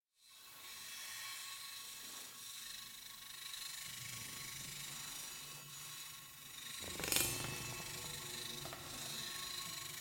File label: Metal Carbon Steel Bowing Brushing ShotgunMic; Guild Wars 2 (Original Foley Soundtrack)